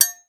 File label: Sword Hit; 1001 Sound Effects